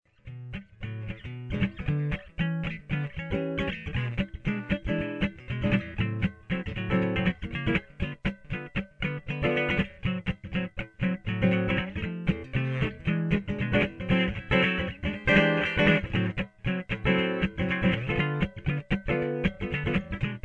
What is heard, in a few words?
rhythm